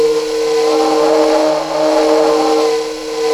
WIND SAX; Zero-G Ambient 1; FLUTE + SAX

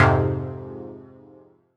Fm Dub Chord; CHORDS; Electronisounds; Enigma Dub Cube One Shots

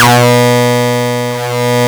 C4, synth; 90 s Sample CDs; NiceBeats; SynthLine VOL-29

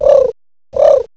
DOVE; ANIMALS; M8; CMI Factory

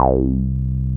BAS, Eurotech; Sounds Good

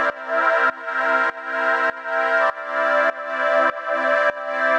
Pad dbx 100 C, sidechained

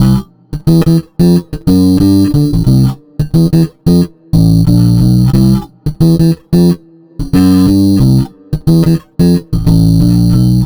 Bit Reduced Bass, Electric Bass

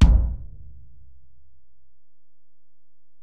Ac BD, Alesis DM-PRO; 300 Drum Machines

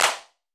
CLAP

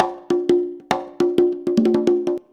CONGA, Modern Percussion Loops

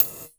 METL GT, DRUMS EFX

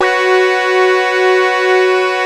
AQUA TARK, Synth; InVision Interactive; Keith Emerson; The Most Dangerous Synth and Organ; SYNTH LAYER

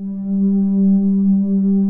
STRING PADS; Pro-Rec - Synthsonic

